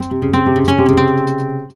Flamenco Guitar Frase; Best Service ProSamples vol.46 - Flamenco

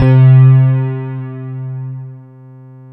E-RHODES

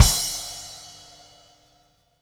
CymKick, Latin Percussion; Sonic Foundry (Sony Creative Software); Joe Vitale Latin Percussion

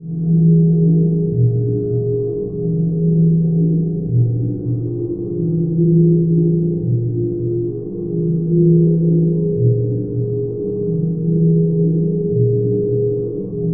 creepy drones; Zero G; Malice In Wonderland

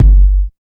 DEEP KICK; East West - Phat & Phunky

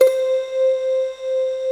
SYN GLASS; Sound & Vision - Gigapack I CD 2

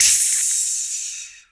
VOC DRUM, MOUTH PERC; Best Service Hyper Dance

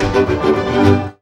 VIOLIN HITS; Best Service; World Colours

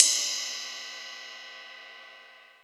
ride, Yamaha TG-500

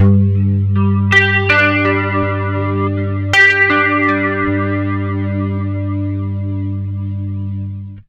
80 MAJ ARP G, Acoustic Guitar Loops; USB Soundscan vol.04 - Electric & Acoustic Guitar Loops; AKAI

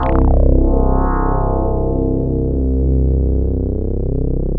SYN, SYN Analog

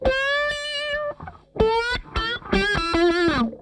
DRIVER LICK, Funk Guitar; 90 s Sample CDs; Zero G

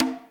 Conga Mid; Groove Elements

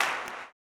CLAP, Blues Soul; Spectrasonics Vocal Planet

